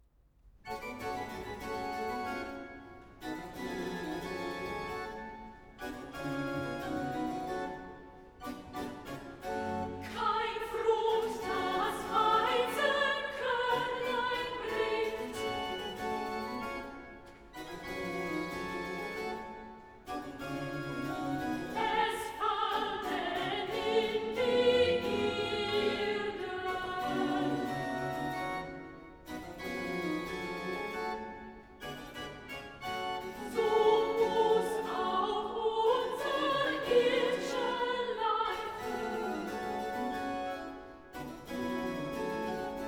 choral, soprano; vocal; BWV114; Kein Frucht das Weisenkornlein bringt; BACH

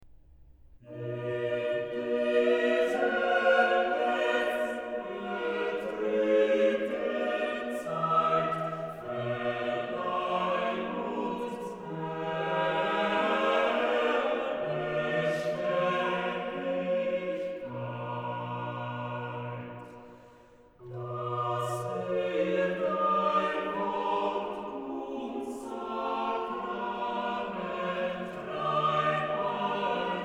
Chorale, organ; BWV0253; In dieser lett'n betr bten Zeit; BACH; BACH ORGAN WORKS; CHORALE PRELUDES

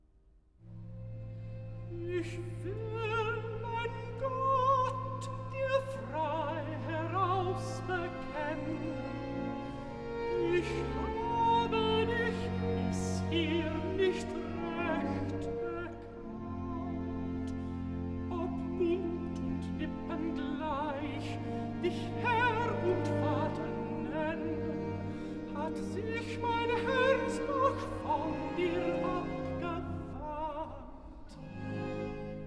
Recitativo (Alt), BACH for ALTO; Bereitet die Wege bereitet die Bahn!; Ich will mein Gott dir frei heraus

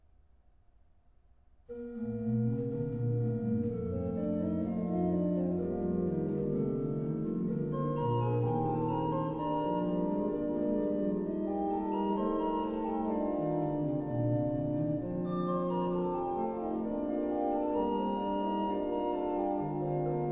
ORGAN; BACH; Neumeister chorales, Chorale preludes; BWV1117 Alle Menschen müssen sterben

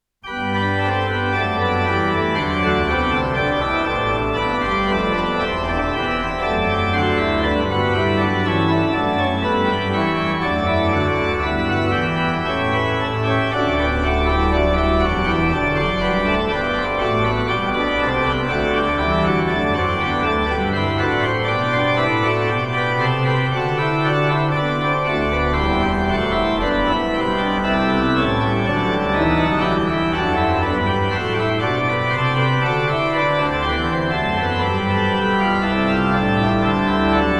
ORGAN; Lobt Gott, ihr Christen, allzugleich; Bach; Bach Organ Works; Choral Preludes

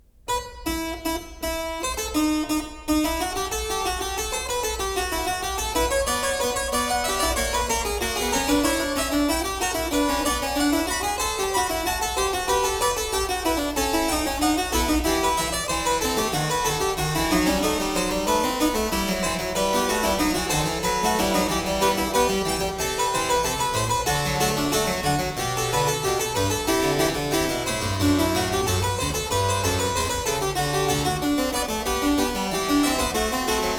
Fugue, Klavier; BACH; WELL-TEMPERED CLAVIER; WTC 2; Fugue 12; BWV 881

